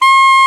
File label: SAX A. FF C, Alto Short; Roland LCDP07 Super Sax